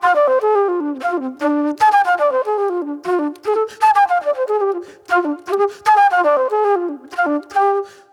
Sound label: Sweet Soul Flute; Sonic Foundry (Sony Creative Software); Manhattan Flutes; Good Times